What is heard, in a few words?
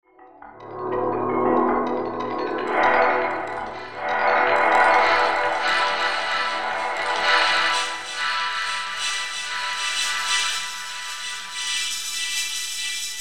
Sweeper Musical Slow Ascend Whoosh Pan; Production Element Imaging Element Accent Transition; Musical Sound Design Sound Effects